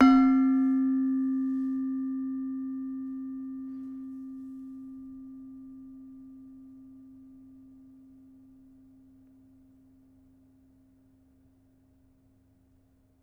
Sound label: Demung hard, gamelan; gravityharps; timbrebox